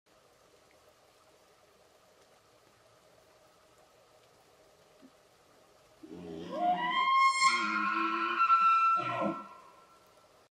Elk; واپیتی

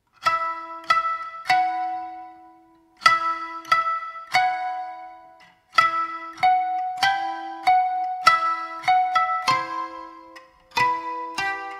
Koto, ساز ژاپنی

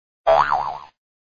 Spring Sound

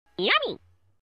yum yum

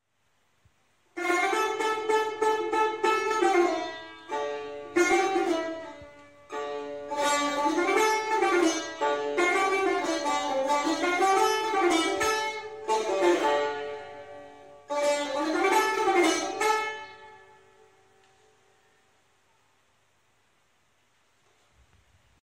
tar instrument

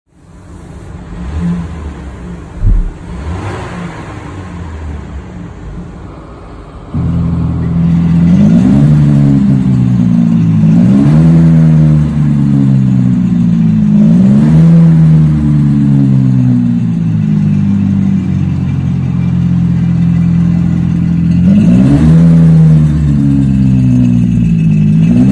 Changan, اگزوز

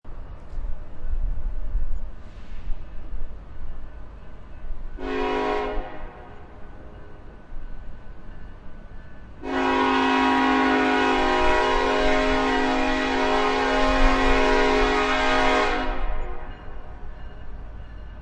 train horn and wheel screeches

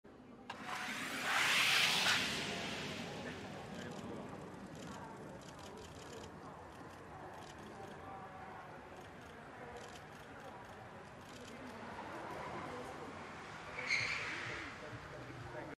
lotus evija, اگزوز; لوتوس اوایا

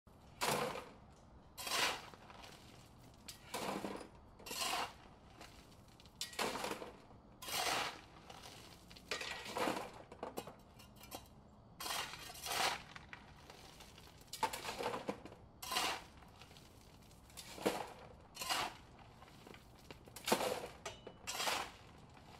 wheelbarrow

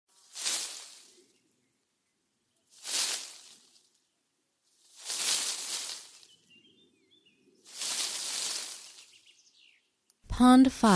bush; خش خش بوته ها

